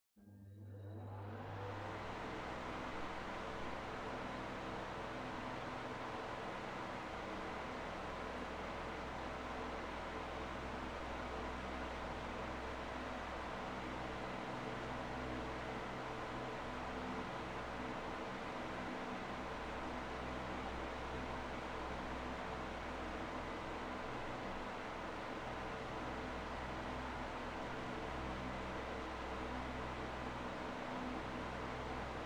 Electric Fan Far High

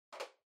Calculator Far Battery Insert

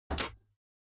TV Turn Off Dry

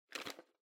Calculator Noise

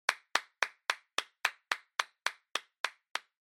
Applause Stable Fast

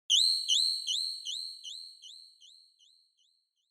Onoma-Flash (Delay); オノマトペ 光る; ディレイ

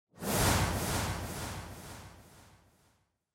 Camera Motion Mid Delay; シーン・カメラモーション; 中 ディレイ

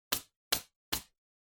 Hand Foley Brush Off

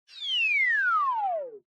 Motion Falling Whistle Long

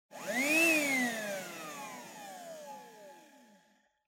Vacuum Cleaner Vacuum

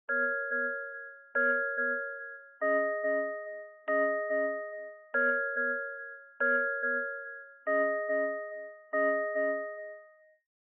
Smartphone Alarm Speaker

